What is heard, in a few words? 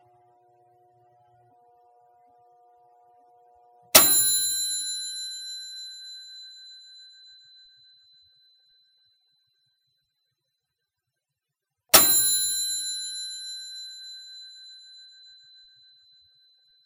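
toaster sounds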